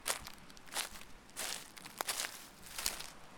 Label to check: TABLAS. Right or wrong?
Wrong